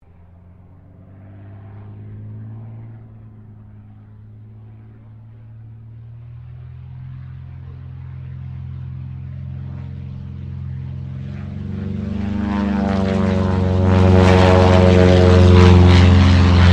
AIRCRAFT CT4A PASS STEREO, RAAF CT4 training aircraft; Airplane; Vehicles